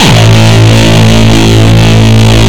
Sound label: Longkick, kicks